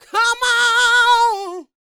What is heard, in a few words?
D BIT, Vocal R&B Dance